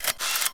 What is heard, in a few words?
shutter click rewind